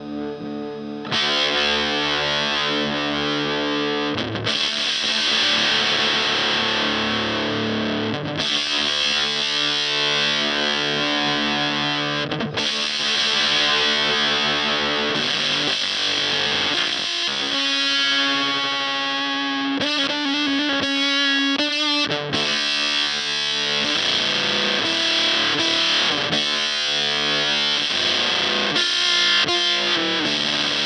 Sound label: Evil Filter Fuzz